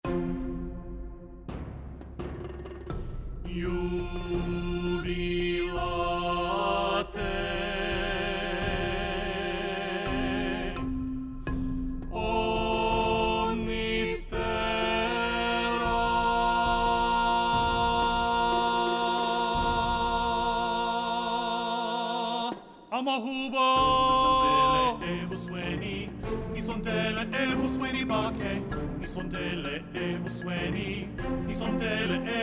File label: Ngokujabula SATB